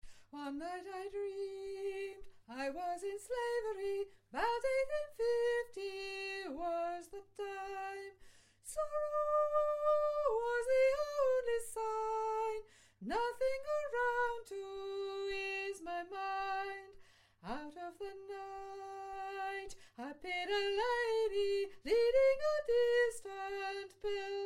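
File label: solo soprano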